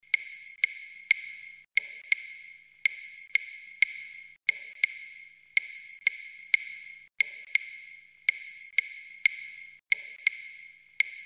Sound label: clave son 2-3